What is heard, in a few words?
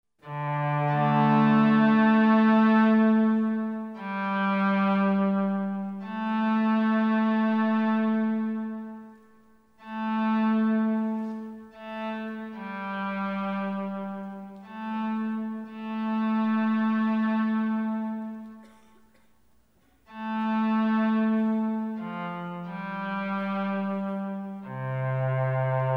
CELLO